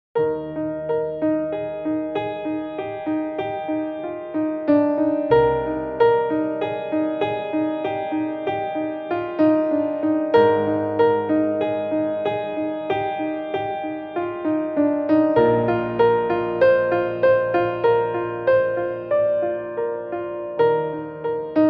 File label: Emotive Piano